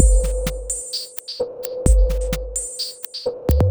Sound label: Beat, Drums & Percussion; Electrocution; Beats